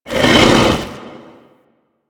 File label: Rock Elemental Attack Vocal; Warframe; Brawler